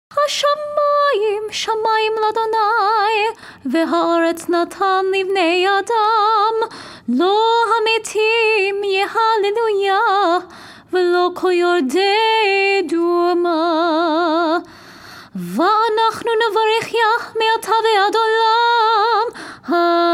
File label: nusach ukrainian dorian; Psalm 115 v.12-18 (Nusah Ukrainian Dorian); Festival Shaharit (Higher Voice)